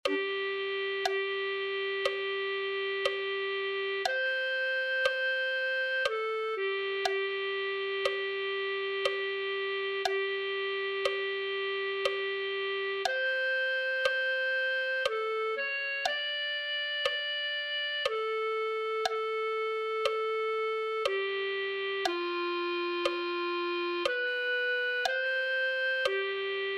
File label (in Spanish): clarinet1